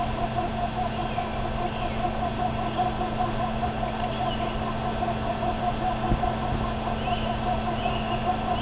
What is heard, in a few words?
birds noise